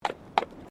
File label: Tram Horse Drawn Horse Step Pavement